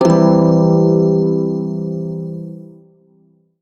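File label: Shawzin Major Chord; Warframe; Prime Shawzin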